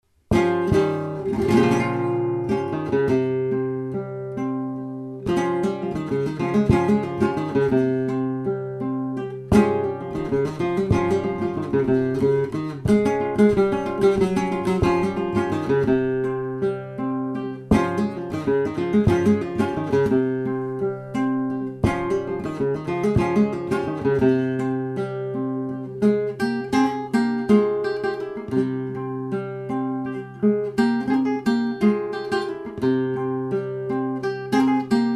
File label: seguiria, flamenco